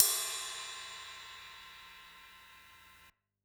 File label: Ride; musicradar; Drum Hits B